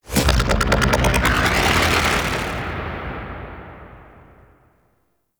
Void Tower Capture Started

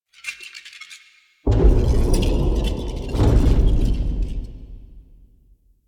Movement E; The New War; Erra; Cinematics

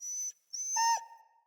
Wolf Cub Trapped Call Close; Animals; Wolf Cubs; Orengal Wolf Cub C

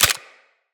Dry Fire Pistol; Weapons

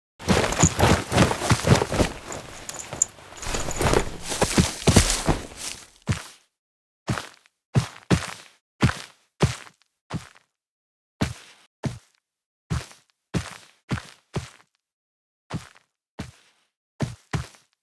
Foley; Cinematics; Drifter Lotus End

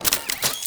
Alt Mech, pistol; Crp BE Plinx; Blastor Pistol

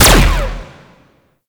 Hazard Laser Beam Start; Gameplay; Stadium; Warframe; Nineteen Ninety Nine

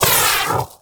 sash impact; Armillary Sash; Warframe; Nezha

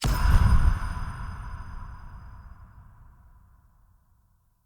Skill Tree Purchase Done

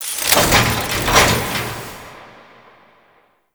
Foundry On And Off; Warframe; Nineteen Ninety Nine; Tailor Shop

